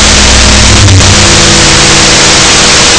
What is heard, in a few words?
nonRayleigh noise data recorded at sensor3 speed40kmh